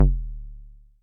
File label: bass stabs; FutureRetro FR777